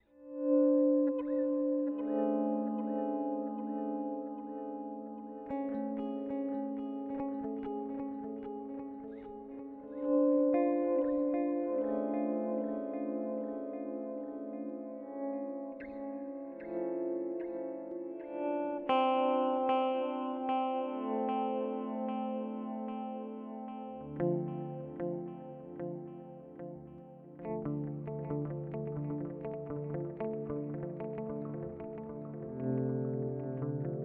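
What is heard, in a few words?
Avalanche Run V2 Stereo swell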